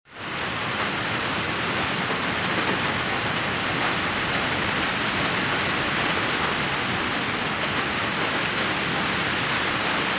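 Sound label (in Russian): silnyj veter i silnyj dozhd hleshhet po kryshe; Звук ветра и дождя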